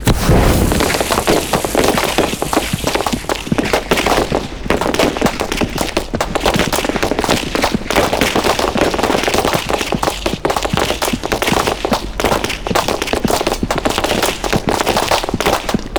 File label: decoy long; arccw go